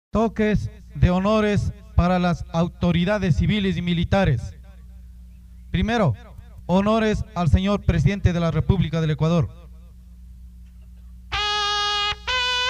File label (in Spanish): toque de honores presidente republica, toques de trompeta de caballeria; himnos de caballeria